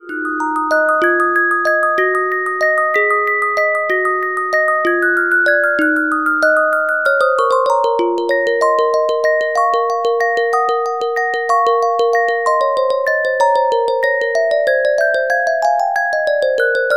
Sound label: Carillon Music Box Melodies